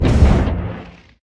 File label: torpedo; World Of Warships